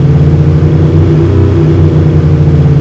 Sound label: motor